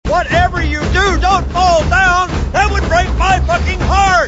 fall; hl2dm

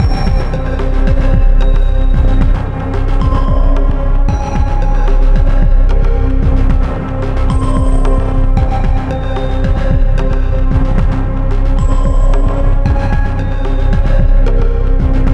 music default ambience

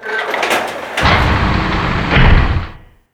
main door open; tf; 2fort tfc; doors

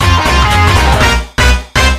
pre minigame; tf; microtf2; twarioman

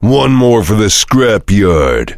heavy laughter big; tf; duke

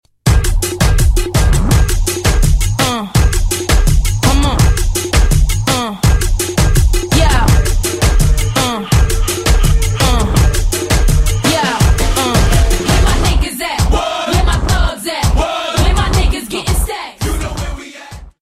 riff

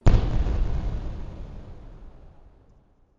firework low

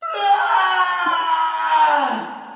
death; freak fortress 2; phoenix

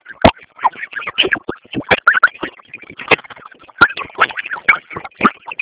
hit; freak fortress 2; mac

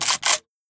shotgun cock; weapons; hl2dm

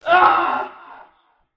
scream

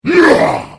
moarg alert

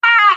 death; tf; redsun

